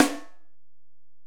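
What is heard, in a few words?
Acoustic Snare, Alesis DM-PRO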